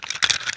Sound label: quiver; minetest x bows